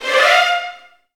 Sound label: VN FX, Virtuoso Strings; ILIO; Kirk Hunter